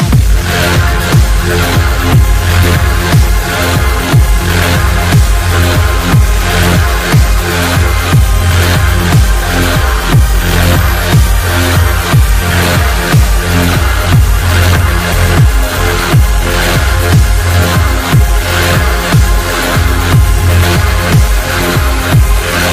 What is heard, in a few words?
Drop, wub wub wub; TF2Maps